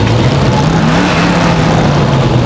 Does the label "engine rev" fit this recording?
Yes